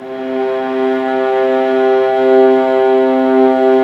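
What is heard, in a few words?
violas; String Master Series; Vlas Sect3-4; Amb p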